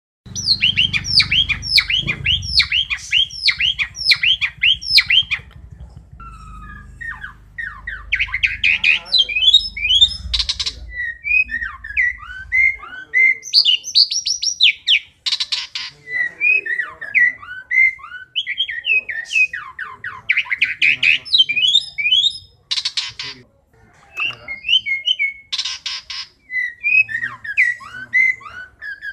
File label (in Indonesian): jalak suren isian variasi